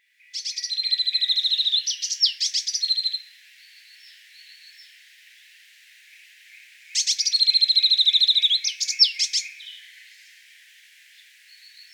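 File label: sinica knyazek, azure tit; ornitologiya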